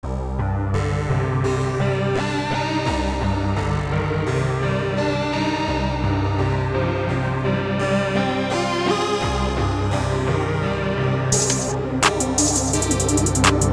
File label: beats